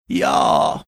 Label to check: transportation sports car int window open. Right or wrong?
Wrong